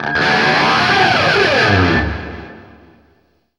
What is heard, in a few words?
scrape, guitar